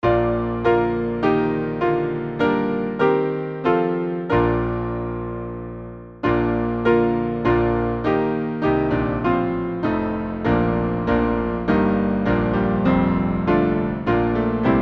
Hymn; Hymnal 1982

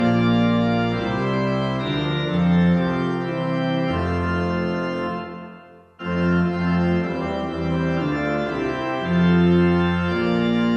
Hymn, Gaudeamus pariter; Come ye Faithful raise the Strain; Hymnal 1982

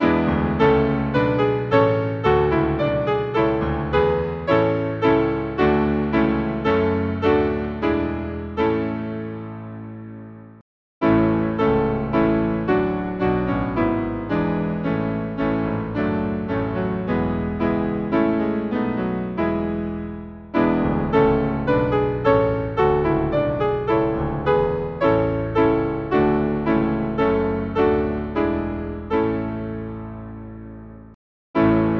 Hymn, piano; Before the Lords eternal throne; Winchester New

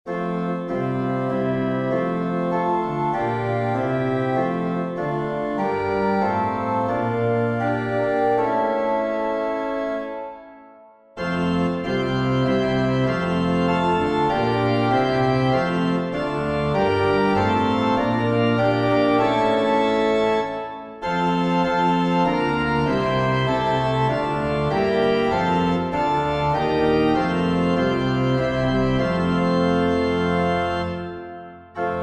organ, St Flavian; Lord who throughout these forty days

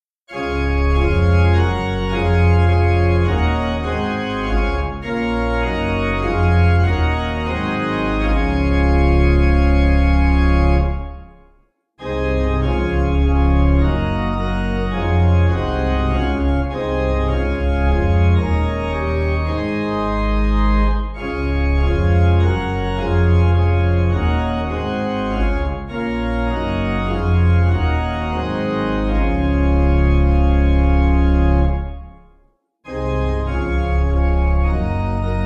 organ 5vs; Hymnal 1982

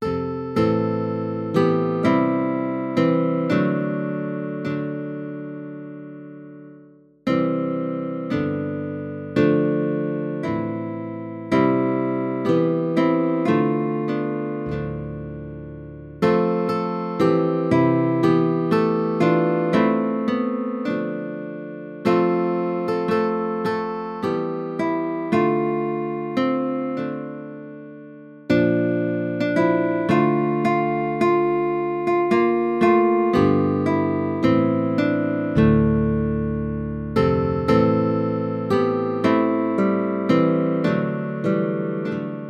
guitar Sanctus; Kyrie Gloria Sanctus

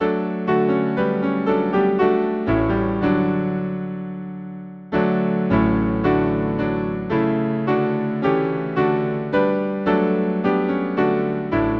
Hymn, piano; Lo he comes, with clouds descending; St Thomas

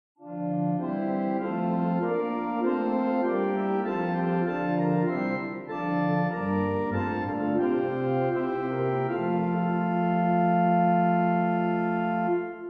Hymn Forest Green Organ Trumpet; 4 verses; O Little Town Of Bethlehem; Hymnal 1982

